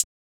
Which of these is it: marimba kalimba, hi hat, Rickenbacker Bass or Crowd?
hi hat